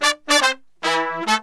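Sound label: brass 110 A minor, brass murga; Best Service ProSamples vol.14; World Grooves; AKAI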